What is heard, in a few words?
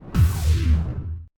box open